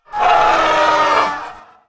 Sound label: Majestic Stag Death